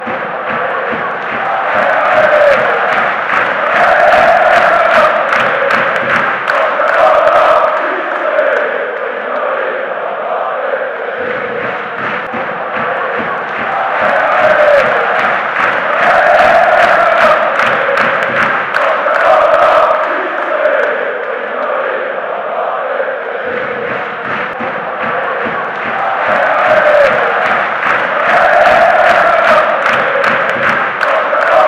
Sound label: rapid chant